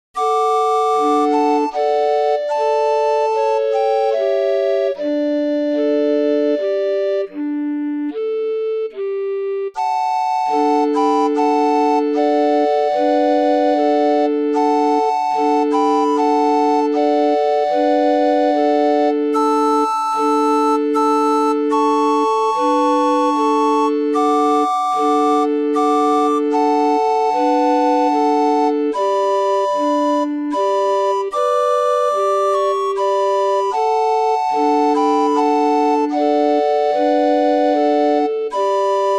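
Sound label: Silent night Short, orgue de barbarie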